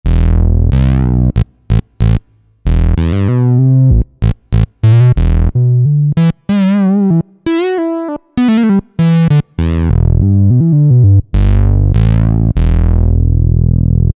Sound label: CS, Yamaha A4000 Piano Keys Synth; Piano - Keyboards